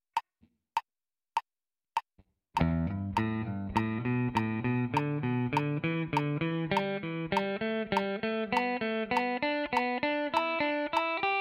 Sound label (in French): Gamme pentatonique mineure brisée; position 1